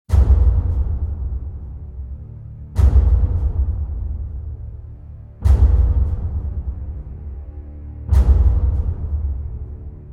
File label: Armageddon Drum & Drone; Abbey Road